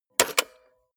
cassette player slide tray take out hard

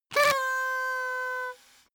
paper hoot soft

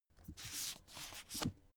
book take shelve soft

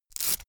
velcro large open quick

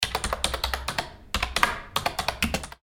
computer keyboard

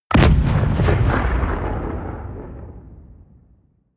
bomb fragmentation distant

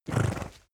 horse blow short

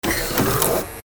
electric door

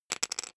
poker chip fall wobble short

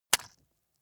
leather impact mud hard squelch

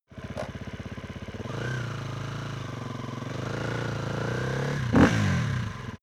motorcycle sport bike idle start ride

